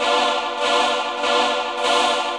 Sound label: KEYB; MAGIX